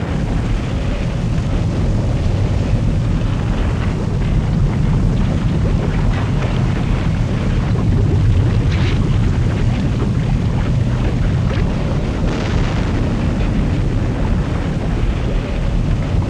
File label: Volcano And Lava Flow